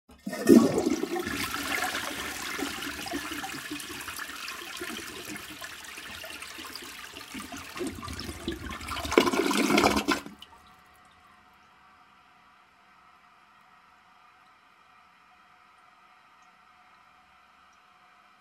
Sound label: Toilet bowl, flushing and filling